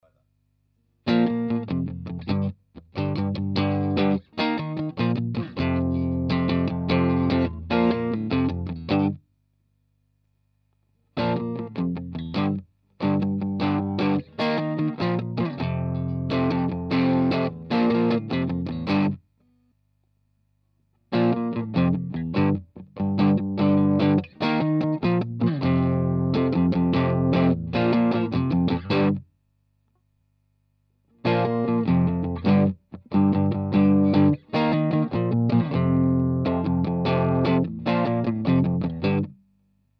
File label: boss bd 2 mod phat switch; Boss Blues Driver BD-2 Fat Heifer Mod; Recorded on Strat, Middle Pick Up though Vox AC-15 Medium Volume; Clean, Stock Boss Blues Driver BD-2, Modded BD-2 (fat switch off); Dexter Mod Pedals